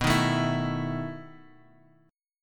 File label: B 11th B, chord; strummed; 7,6,7,9,x,x; JGuitar